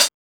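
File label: HH, hi-hat; X-Static Goldmine 1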